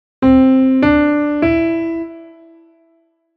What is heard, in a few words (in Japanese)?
ドレミ音源